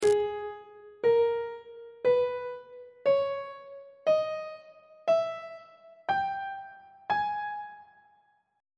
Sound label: a flat harmonic minor scale on treble clef